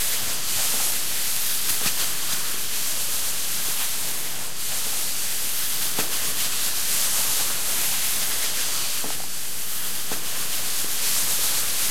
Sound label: Slide Hand; Game rip; MiSide